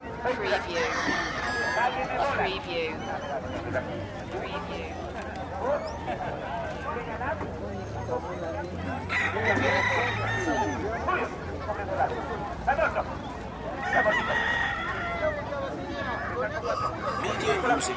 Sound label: animal market